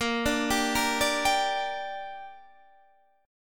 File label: D Suspended 4th Sharp 5th Bb, chord